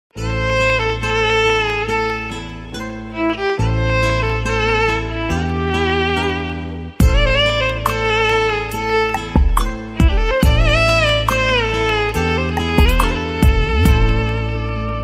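sad music background music sad background music ringtone; rang de movie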